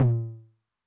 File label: High Tune Med Decay, 909 LOW TOM; classic machines; LT - LOW TOM